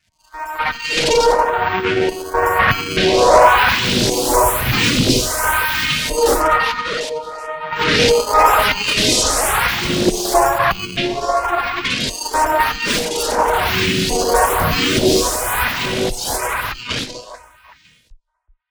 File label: Pads; Spin Cycle